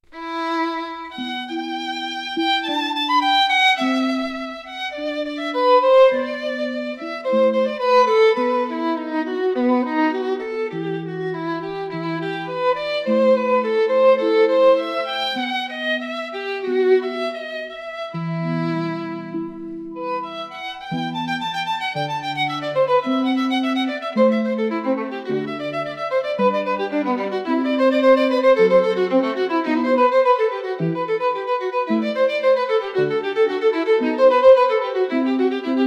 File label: Violin's Lament